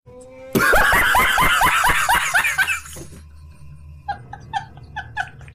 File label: LAUGHING MEME SOUND EFFECT